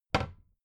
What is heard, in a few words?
cabinet door close regular